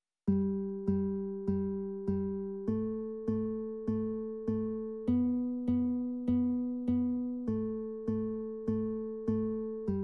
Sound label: accomp; beginner classical guitar lessons; Exercise 7